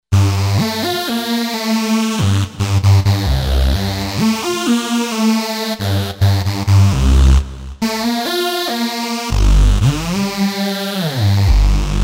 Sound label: Roland V-Synth; The Fazz; Factory Patches